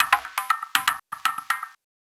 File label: Metro Grainy Beat, Electronic Beats; Sonic Foundry (Sony Creative Software); Myths of Technology